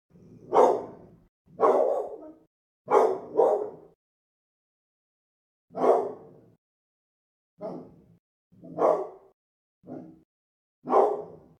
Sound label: dog - Pit bull, animals; Tessa; NS Studios - ultra sound collection